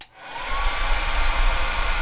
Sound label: hairdryer on